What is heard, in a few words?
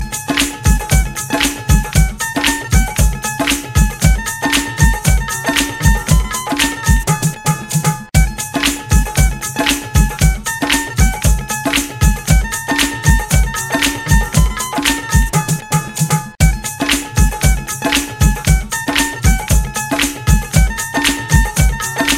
tumbi punjabi, ringtone